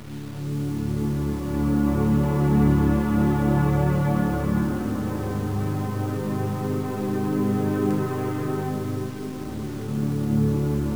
museum intro

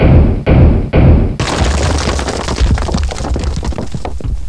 pick stone

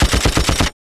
mecha gun; ambience

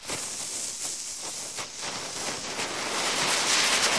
burning fuse

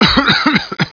cough

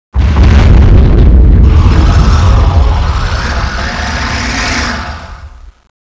impacts; ambience

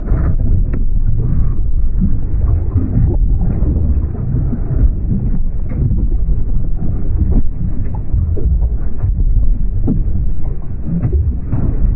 lava loop

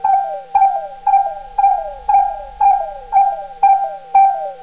bleep

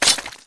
knife slash, impacts flesh; weapons

